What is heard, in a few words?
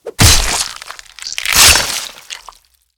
npc kill